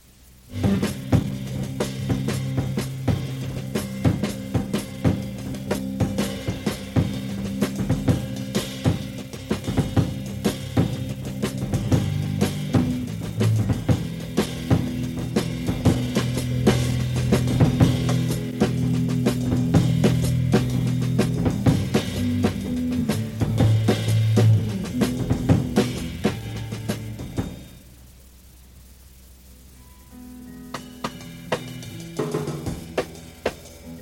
Jams (Flute Jam mixed out)

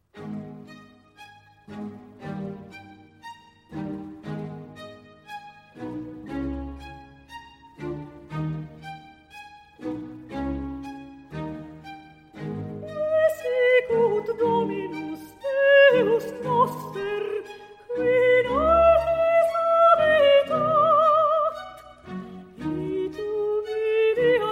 psalm 112 for soprano, 2 violins, viola & bass; Vivaldi; Laudate, pueri Dominum; RV 600

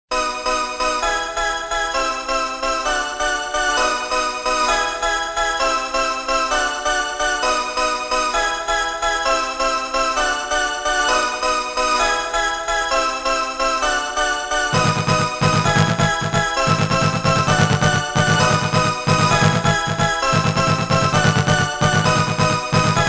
Synth

